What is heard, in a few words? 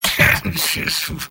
laugh short; Robot-filtered lines from MvM; Soldier Robot